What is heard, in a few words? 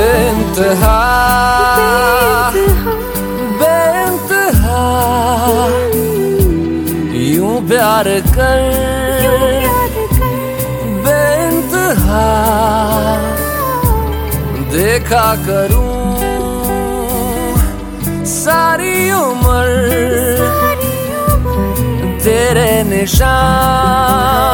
Romantic Hindi Song Ringtone